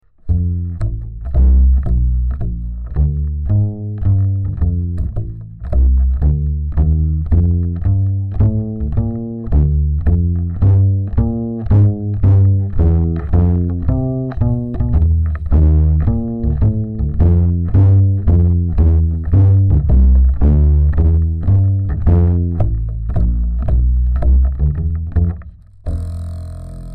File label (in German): Fishman vs Klemm PU